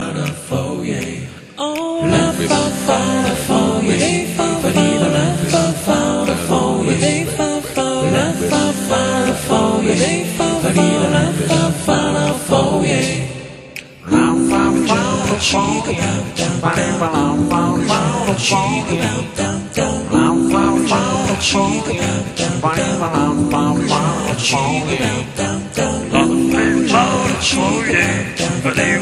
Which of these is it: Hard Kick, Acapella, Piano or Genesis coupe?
Acapella